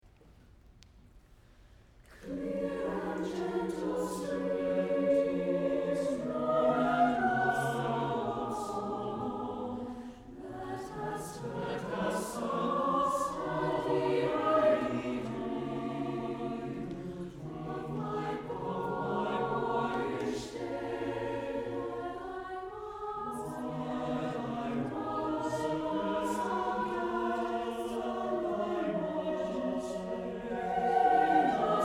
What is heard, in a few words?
Clear and Gentle Stream